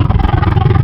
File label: Alligator, Wavetables; Cymatics; Serum; Animals for Serum - Gold Edition